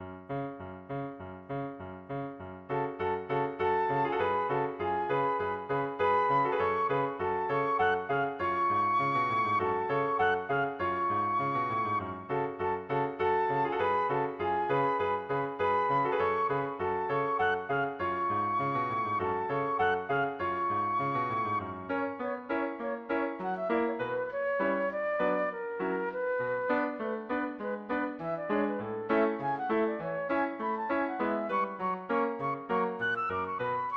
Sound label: danses des cygnets, flute